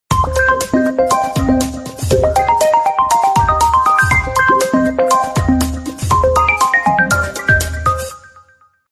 funky sms ringtone

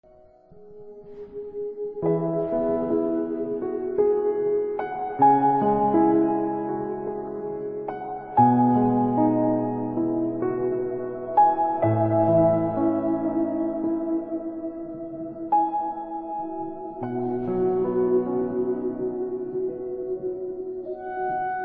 piano

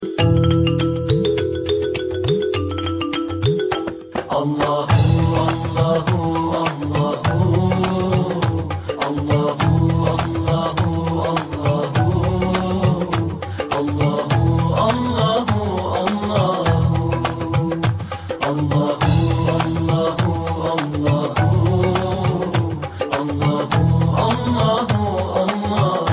allahu allahu qawali ringtone, islamic

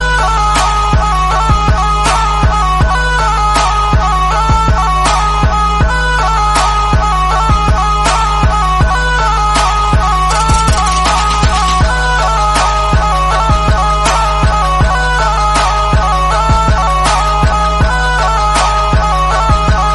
instrumental musical Hollywood ringtone; nokia

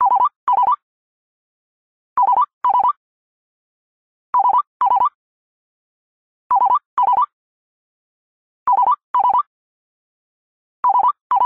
nokia remix music ringtone; signum

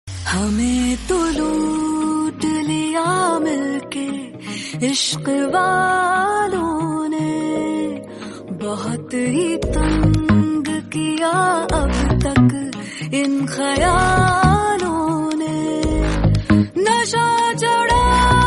movie song tone ringtone, Bollywood; pathan movie song

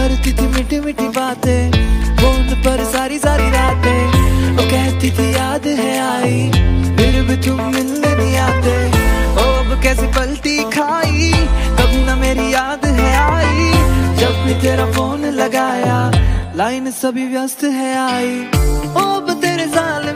hindi music ringtone, bollywood

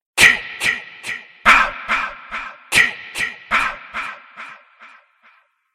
ki ki ki ma ma ma ringtone

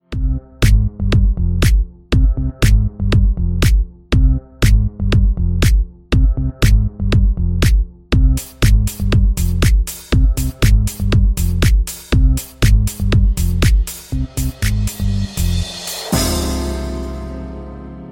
xiaomi note swisshh ringtone

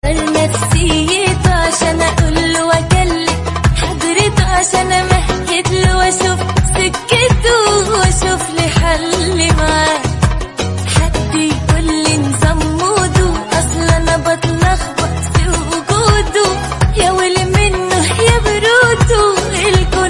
arabic ringtone

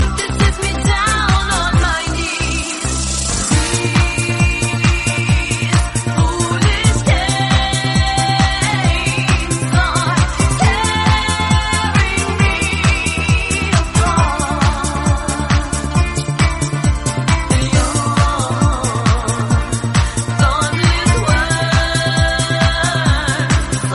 electronica music english song ringtone

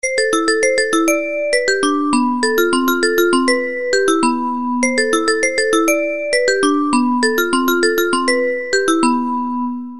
simple call alert tone ringtone

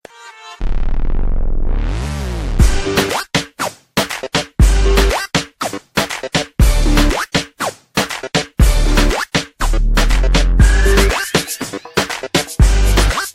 new super ringtone ringtone

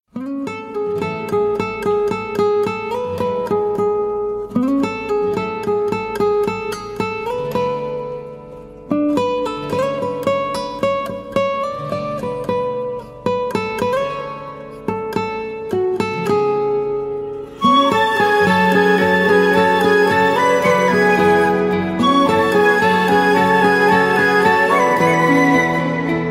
sad ringtone

